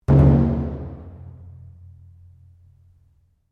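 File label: Drum Taiko Odaiko Double mezzo forte, Large Japanese Taiko Drum; Miscellaneous Musical Sound Effects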